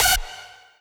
lead

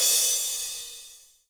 Drum Finish; osu

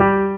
Synth; osu

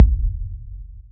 Impact

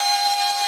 synth main

